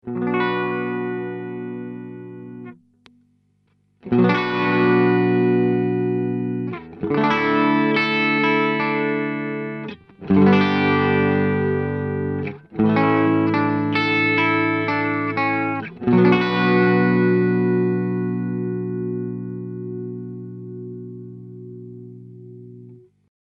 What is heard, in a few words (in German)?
Korg NuTekt TR-S higher Gain clean eingeblendet Single Coil mit SAG